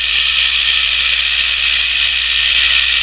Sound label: Meccano Clockwork Motor Running